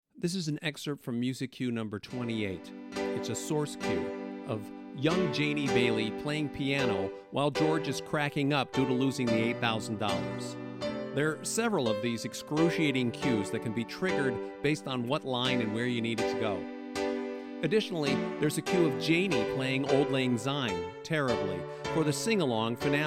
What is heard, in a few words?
Music Cue Piano; MUS-28 Janie's Piano #2; It's A Wonderful Life!